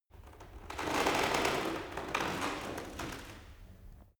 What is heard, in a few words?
cart medieval creak short; kutsche; verkehr transport